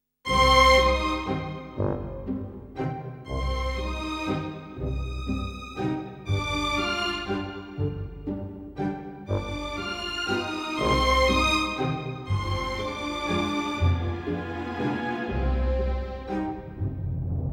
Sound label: quirky